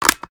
g36c mag click; daffas arsenal; tacz

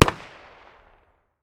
pistol 45 medium distance; 45acp; tacz-tweak-pack; taczmechanics

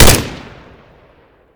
g36c shoot; daffas arsenal; tacz

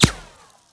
dirt, hit; tacz-tweak-pack; taczmechanics

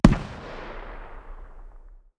hmg dshk medium distance, 12.7x99mm; tacz-tweak-pack; taczmechanics

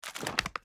g36c put away; daffas arsenal; tacz

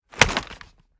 g36c draw; daffas arsenal; tacz

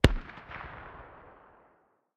hmg dshk far distance, 12.7x99mm; tacz-tweak-pack; taczmechanics

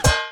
metal, hit; tacz-tweak-pack; taczmechanics

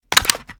g36c mag out; daffas arsenal; tacz

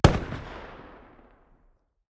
hmg m2 medium distance, 12.7x99mm; tacz-tweak-pack; taczmechanics

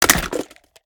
g36 mag out; daffas arsenal; tacz

